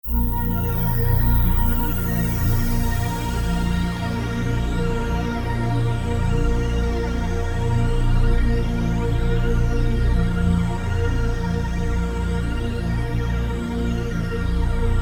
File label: Sweeper Flange